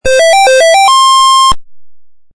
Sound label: brick game handheld level up sound